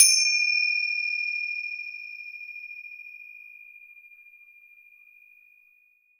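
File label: finger cymbal; ILIO; Synclavier Percussion 2; World & Orchestral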